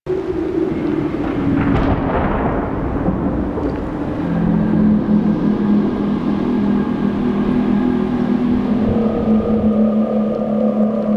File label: Bed Loop, Ambience, Exterior; Warframe; Man In The Wall